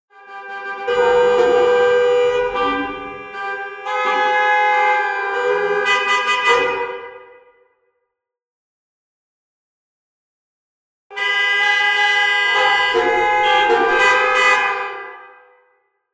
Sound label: Cities nyc Car horns